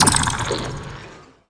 impact, physics, vault

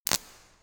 substation oneshot; nuke

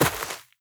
step light, footsteps player grass

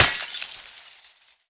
break glass bottle small; physics; breaking

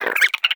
vox twitch, creatures, snark

